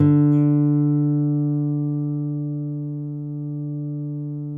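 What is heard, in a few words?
nylon, FLAMENCO, Guitars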